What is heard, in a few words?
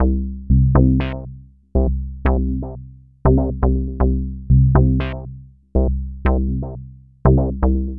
Bassline, Prototechno